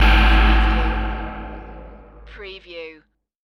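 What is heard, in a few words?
Production Element Hit Bell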